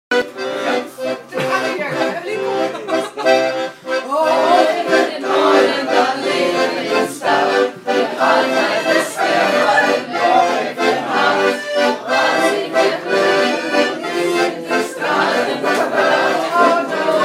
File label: Dutch Sing Along